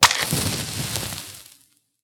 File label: match strike